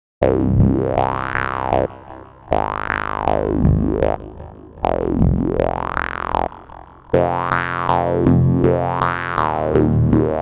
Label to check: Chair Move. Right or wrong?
Wrong